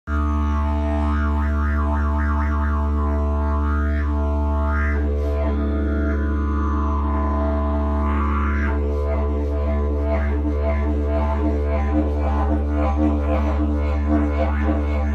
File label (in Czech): jilm, didgeridoo; ponocny